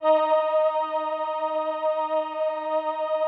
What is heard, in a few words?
VP-330, String Machines